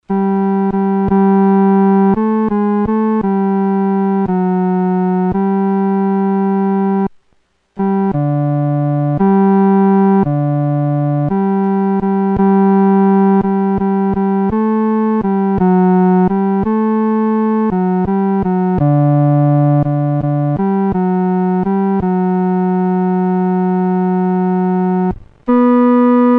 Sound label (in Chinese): 独奏（第三声）